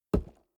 lumber tree

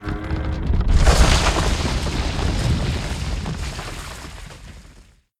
building collapse large, destruction

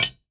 mine metal

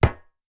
sling metal, attack impact

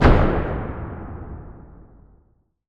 warship attack cannon; ships siege

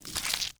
gather meat

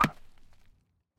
arrow wood, attack impact

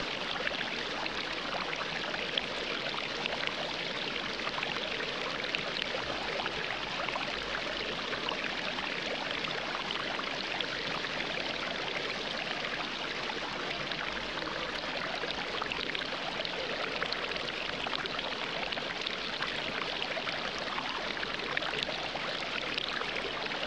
river slow

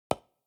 construction wood

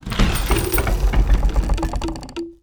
alarm ballista

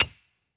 mine stone

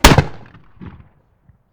Musket

